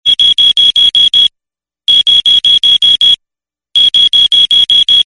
alarm constant chirp

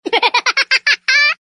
comedy silly laugh

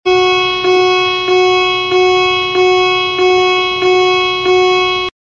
alarm general ship

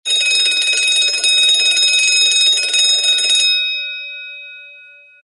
bell fire constant ring

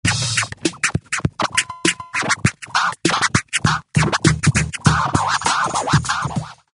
swingin 808s scratch

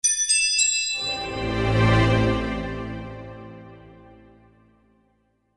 Ipad Executive Alert

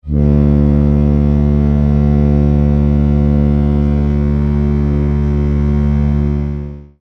Horn Alert; Rms Queen Mary 2

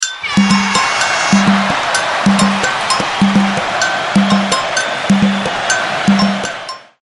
brazil a go go rhythm

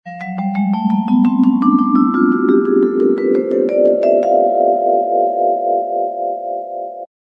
vibes fast cascade up

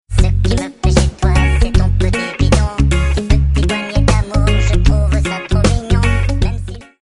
karaoke

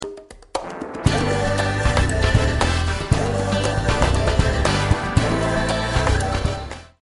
swaying and smooth

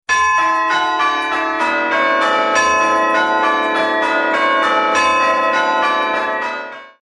Carol Bell Alert

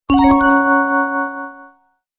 Message Alert Tone

